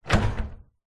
household garage door wooden closed impact rattle; zapsplat

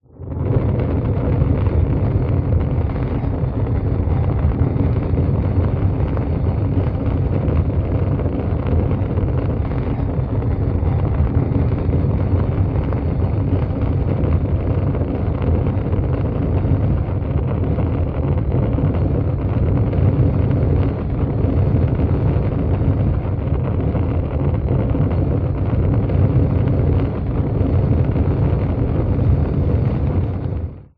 nature fire flames large burn movement

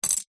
cutlery plastic knife set down table; household

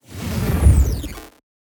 Whoosh

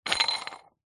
sport dumbbell collar spin tighten; zapsplat

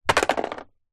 foley cup fast food empty squashed drop; zapsplat

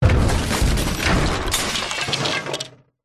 impacts junk hard crash metal wood glass

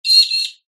bird peewee call australia; zapsplat

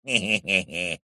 cartoon male vocal nasal sly laugh

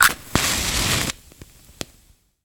household match strike; sound effects one

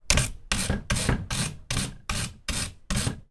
industrial wire brush on wooden surface

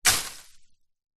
bushes leaves hit with wood post; foley

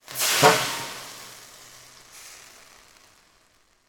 water sizzle on hot metal plate; sound effects one

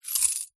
coins movement hand; foley

